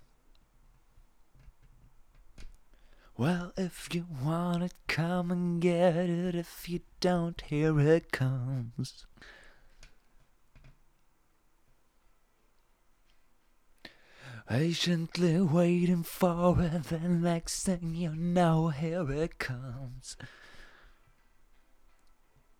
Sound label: vox; logic